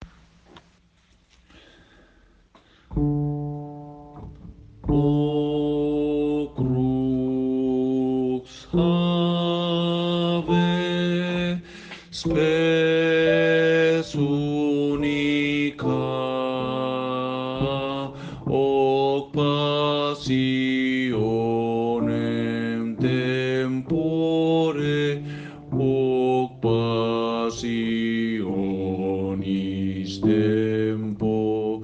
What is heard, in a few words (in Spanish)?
bajos; O Crux Ave